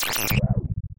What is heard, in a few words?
portal invalid surface, portalgun; portalmod gun; weapons